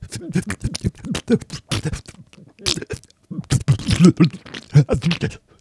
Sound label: repulsive splutter; ringtones